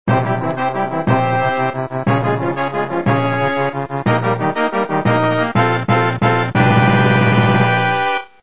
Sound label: Boss Complete; super mario flash